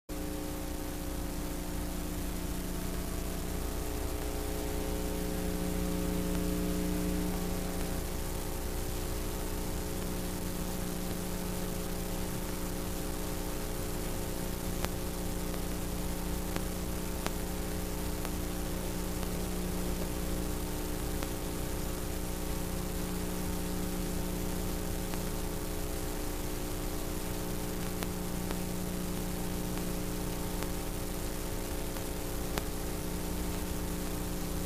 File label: line noise, Vinyl